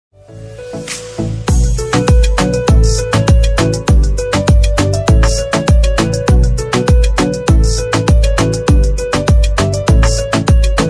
instrumental ringtone romantic ringtone instrumental ringtone